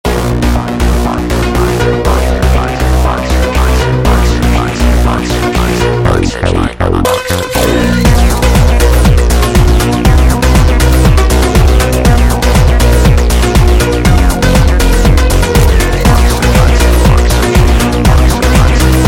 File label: sped Up, funk